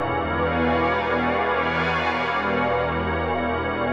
122 BPM Pads B maj G min Tonic E, ambient synth pads; MMB